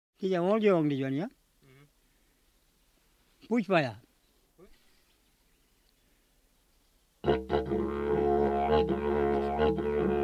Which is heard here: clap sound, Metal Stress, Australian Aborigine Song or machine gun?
Australian Aborigine Song